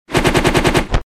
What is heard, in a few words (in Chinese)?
打斗-双手结印